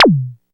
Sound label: Chirp one shot, SFX; Sonic Foundry (Sony Creative Software); Electronic Point Blank